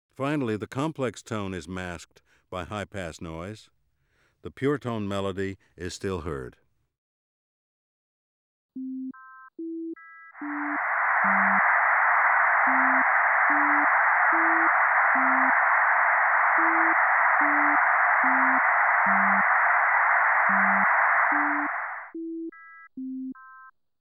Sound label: Masking Spectral And Virtual Pitch